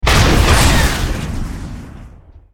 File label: Enemy Weapon Antiair